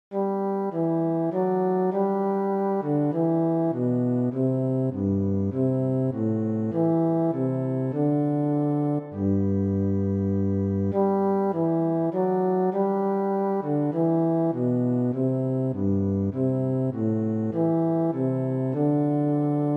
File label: bass